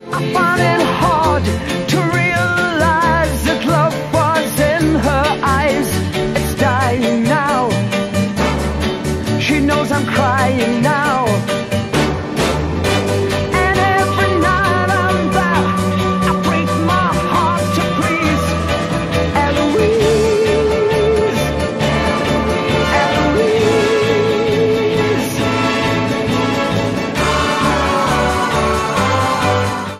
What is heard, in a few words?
Ringtone